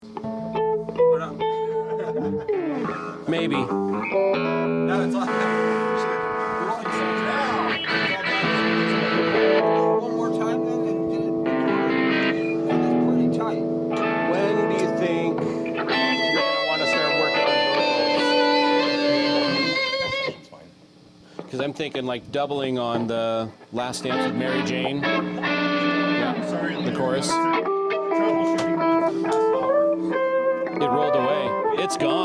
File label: Background Noise Mix